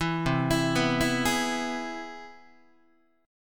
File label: C Augmented Major 7th, Guitar; arpeggiated; JGuitar